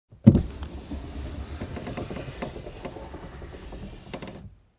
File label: Lowering car window